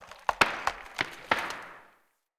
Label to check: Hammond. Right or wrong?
Wrong